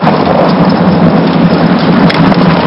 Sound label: dung boulder; city